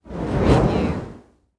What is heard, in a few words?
Fire Torch Single Pass By Whoosh Slow